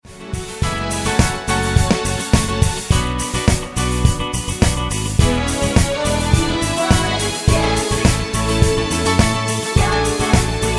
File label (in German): mit Chor